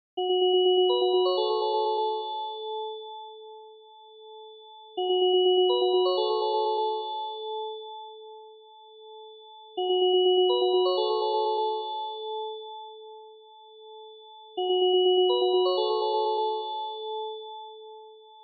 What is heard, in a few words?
lg the bell; ringtones